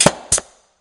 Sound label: nailgun